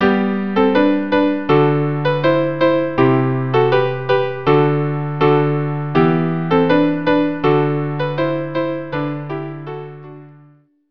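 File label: violin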